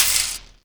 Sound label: DM5 Perc, Alesis DM5 drum machine